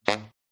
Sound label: Sax Honk